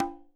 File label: Conga Hi Slap